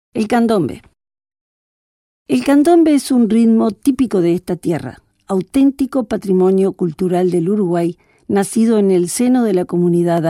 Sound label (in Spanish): Candombe; patrimonio